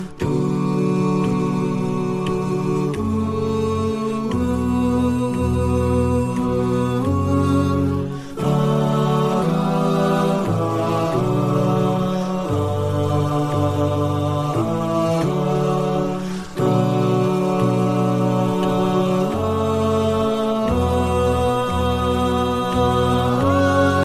islamic

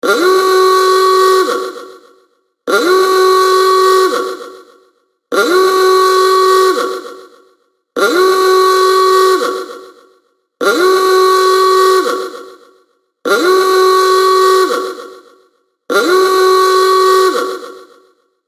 Ahooga Prison Alert

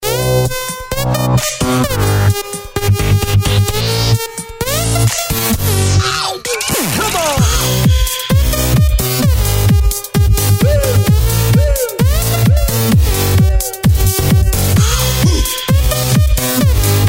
Trance Mixx

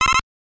Fill Gauge; Megaman 2

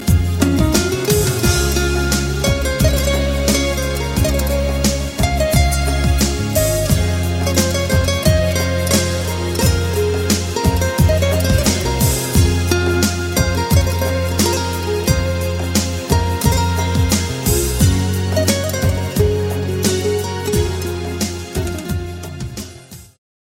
Turky Guitar

